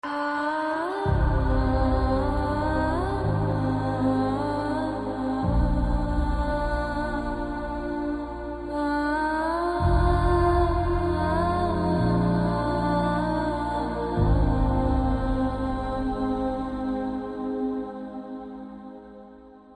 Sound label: Mother Humming Music; KGF Chapter 2